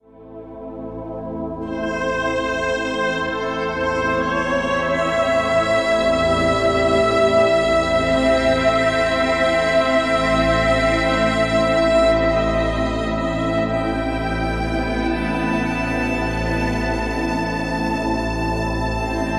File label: Gypsy Violin